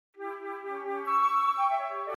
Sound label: Ashibue fl